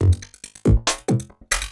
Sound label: LOOP, 140 BPM, Drum & Bass